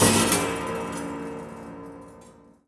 Prepared Piano; Battery 3 Sample Kits

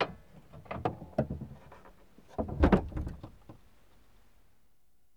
RUMBLING, Transportation

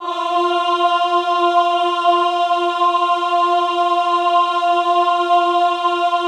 AHH F3, Classical Choir; Best Service; Extended Classical Choir; AHH MIX

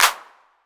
808 CLP; Wizoo; Platinum 24 Electronic; PERC TR

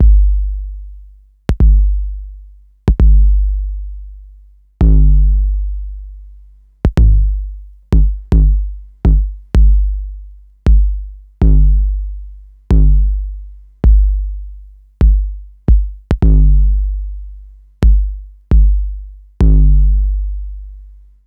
808 tape kick

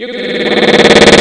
SWELL CROSS; Soundengine - CSR-000 The Art of Transwaves; MOVING PART

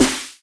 SD HOU, Dance Mega Drums; Best Service

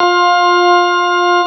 CX3 ORG F4, Keyboards Old School; USB Soundscan vol.09; AKAI

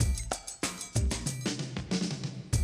Classic DrumLoops

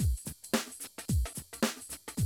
110, Classic Drum Loops

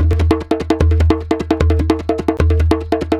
PERC, Roots Disco; USB Soundscan vol.32; AKAI

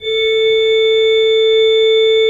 A3, Cathedral Organ; ILIO; Propeller Islands Cathedral Organ

